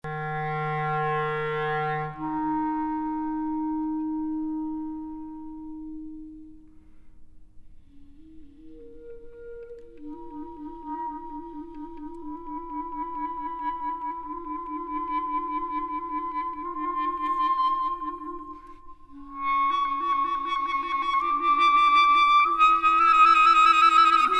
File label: Clarinet